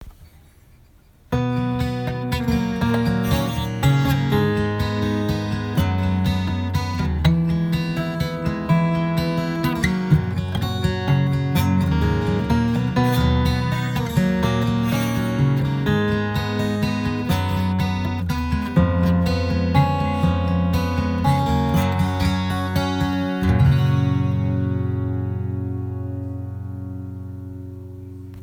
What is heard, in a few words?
Guild F412 finger